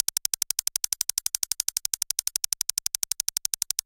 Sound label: bigwin counter; Hot Party Deluxe; Wazdan